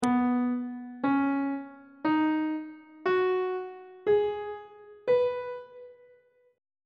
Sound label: c flat major pentatonic scale on treble clef